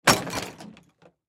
Golf Bag Drop On Cement; Drops (Sound Effects:Objects); Foley; Blastwave

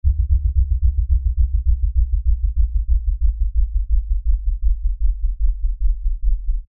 Lfe Descend Low Bass; Descending

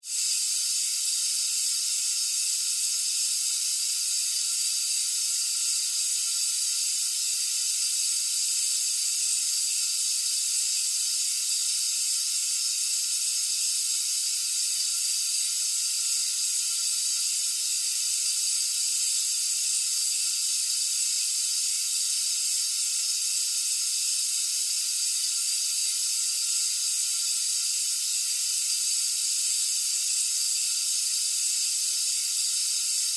Gas Leak Hose; Science, Fiction; Spacecrafts

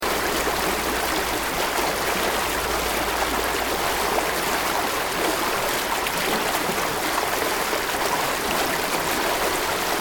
Water River Fast Rocks Loop; Elemental weather effects; Nature

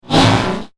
Laser Chainsaw, science fiction sound effect

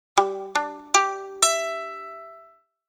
Violin Plucks, Plucking the strings on a Violin.; Musical

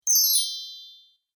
Magic Fairy Wand Wave

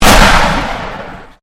Convolution Pulse

